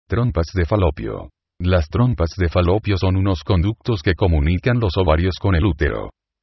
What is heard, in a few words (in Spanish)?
trompas